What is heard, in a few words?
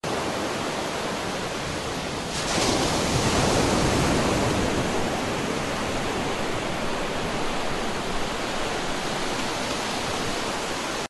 ocean